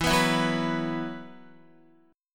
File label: E Suspended 2nd E, Guitar, 4E,4A,5D,5G,5C,6F; strummed; Esus2; x,7,9,9,6,6